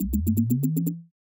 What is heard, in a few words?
Generator Turn On; Custom UI Sounds; The Division 1